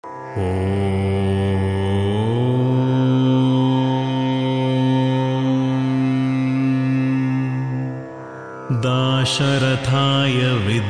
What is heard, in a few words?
rama gayatri